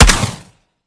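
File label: weap suppressed; gmod; weapons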